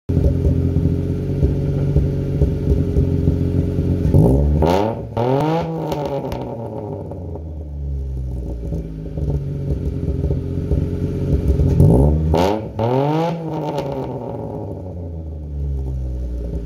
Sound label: daewoo matiz